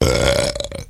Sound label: burp short